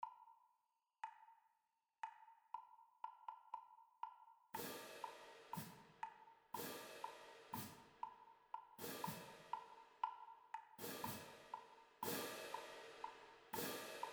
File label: Cymbal Percussion Tryout; rolla bands